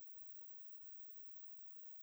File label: Gas Leak; Handheld Acoustic Imager